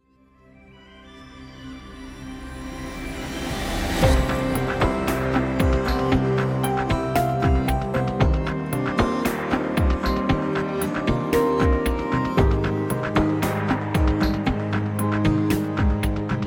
login intro; Resonance Solstice; android

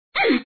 Hurt; Blaze; Sonic Smash Brothers Flash Online

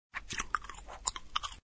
Chew; F.A.M.P.S

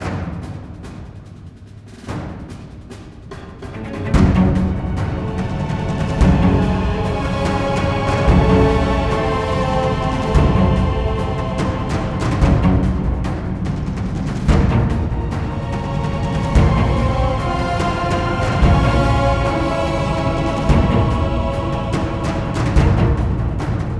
Pvp

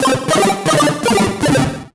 Solo Chip Arp Negative; MUSIC EFFECT; startide

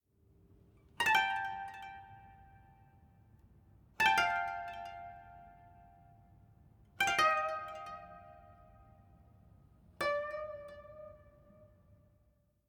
Savannah Music

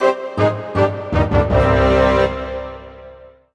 Orchestral Brass Negative

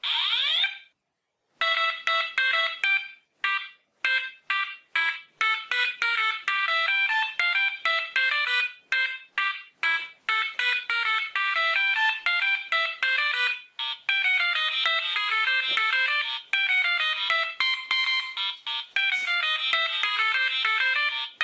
Fire alarm; Butterfly Soup; Android

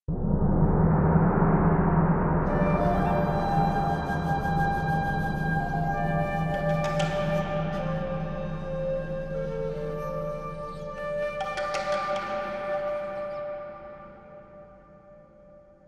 Great Wall Front

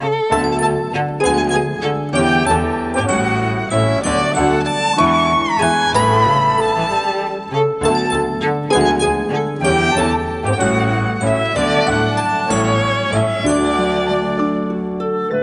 Ball Ambience

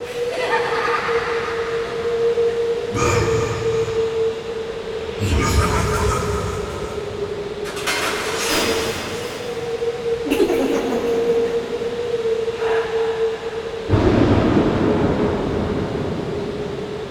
Arcade Menu Ghost Ambience

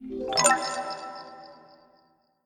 Orb Grab; Blastmorph Pinball; xbox series xs

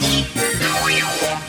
Mini Game - Pachinko; Shadows of the Damned; Pachinko Atari

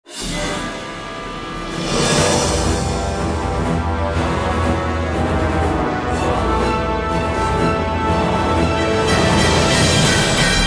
Attack Music; mad global thermonuclear warfare

